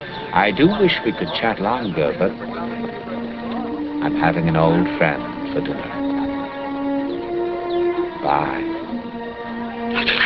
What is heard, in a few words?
silence